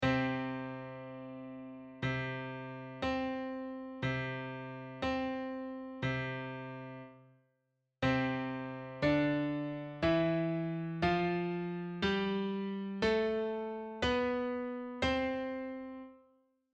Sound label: perfect octive